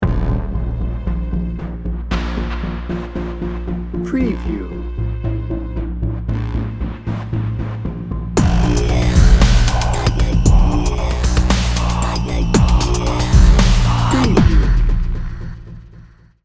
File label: stalker menace